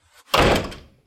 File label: regular door slam; soundscrate